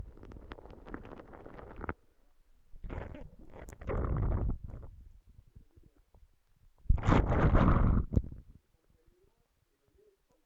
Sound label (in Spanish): toma mate; Oído Absoluto